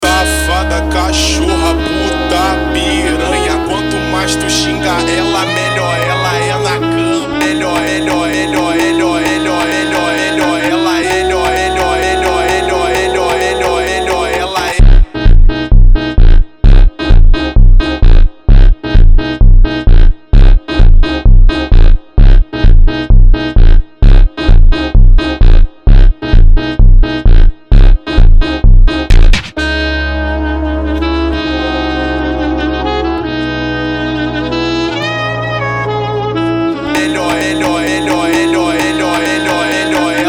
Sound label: funk do trumpet